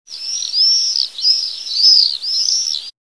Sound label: apus apus